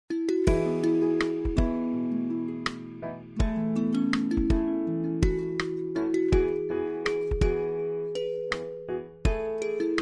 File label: travel Bg Music; syy travel overseas game